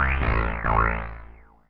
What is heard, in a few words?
TEKNO 140, Techno; Techno2; Sphere